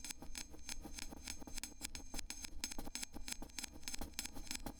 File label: Cymbal Rain, Psychotropic Cymbals; Ambient Cinematic